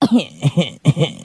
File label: crying sarcastic; ooh ahh; human